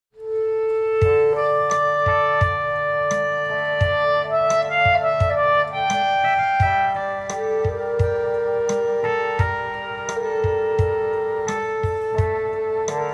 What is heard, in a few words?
Clarinet